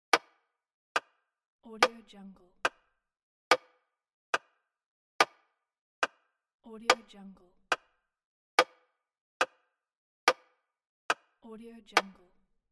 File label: old clock ticking and ringing